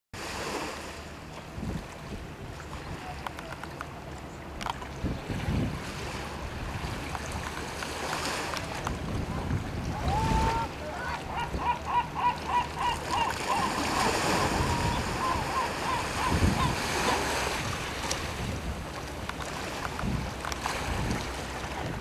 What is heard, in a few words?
atlantic yellow-legged gull ponta delgada ad long calls